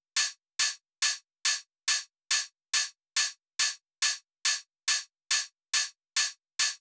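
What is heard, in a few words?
drum Loop 140BPM open hi-hat; Vengeance - Essential Clubsounds Vol.5; VEC5 Drumloops; VEC5 Multi Loops 140BPM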